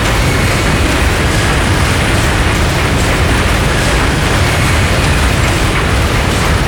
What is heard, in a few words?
Floor Elev Gears Loop